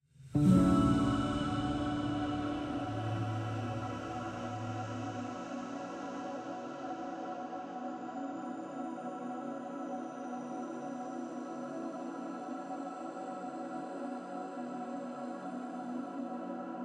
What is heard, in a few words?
Forest Music, Recording Buttons Stinger D; One Hand Clapping